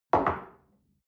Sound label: espresso place on table soft, restaurant cafe